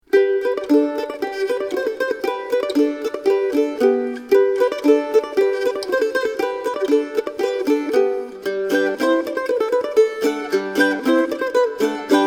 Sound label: gopak mix